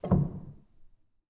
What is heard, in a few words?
rel, Grand